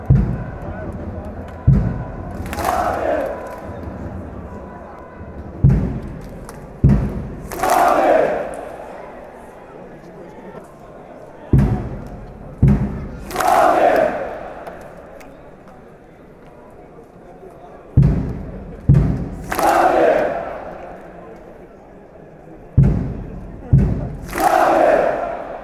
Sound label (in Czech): bubny, fanchants; slavia